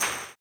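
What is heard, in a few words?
tambourine, 160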